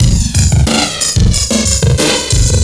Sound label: phat beat